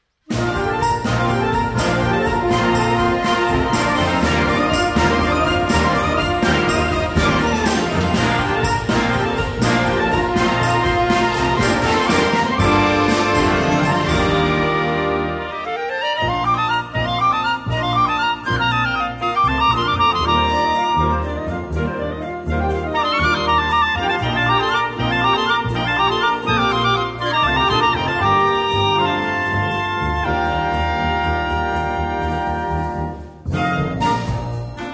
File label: Interlude for Clarinet and Flute